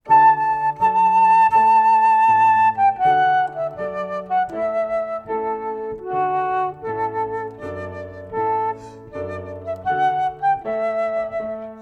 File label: Trumpet Tune; Jeremiah Clarke; Wedding and Special Occasion Music